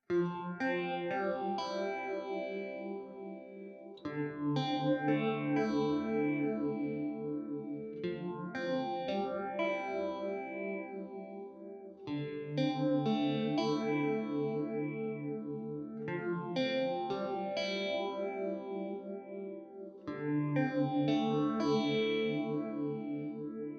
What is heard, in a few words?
Phaser, modulation pedals